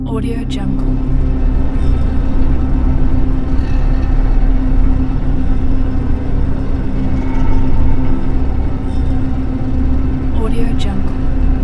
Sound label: bass drone